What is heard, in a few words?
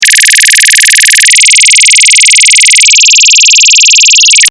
fm synths